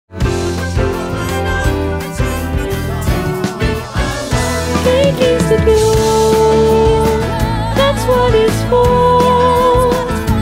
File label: finale ensemble female vocals